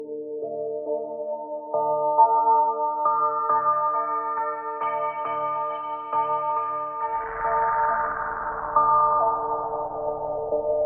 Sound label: Indian Bell Chant